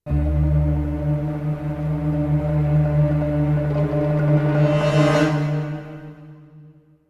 suspence short; Chrome

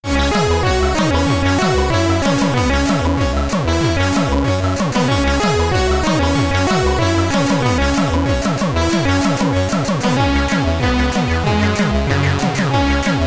Well Done! (Stage Clear) (SID Stereo); creatures; commodore 64

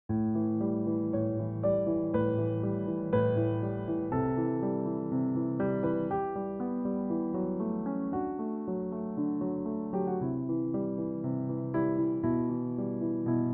Piano Intro; rusty lake paradise

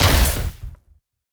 Weapon Shoot

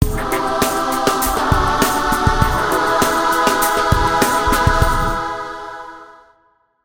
Level Sucess; Darwin the Monkey

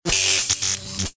Tesla Shock; Gameplay Wpn

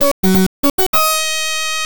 Unknown Jingle; puyo puyo tsuu; genesis

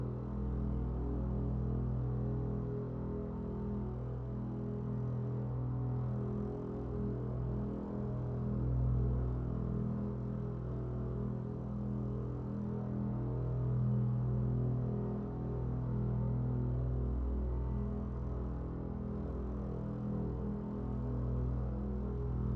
String Drone; bramble the mountain king; ps4 ps5 switch windows xbox one xbox series xs; Lyktgubben

